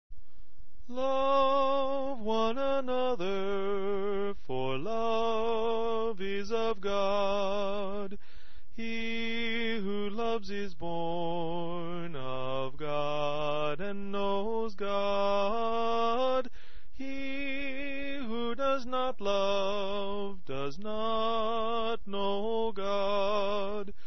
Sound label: The Greatest Commands Alto